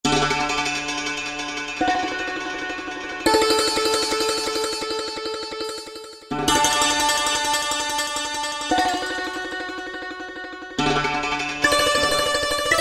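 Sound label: Santur Tremolo, Roland SR-JV80-14 World Collection Asia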